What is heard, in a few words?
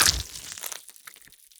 bloodloss runs; OldThink